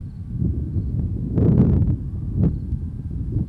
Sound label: Crickets; field recordings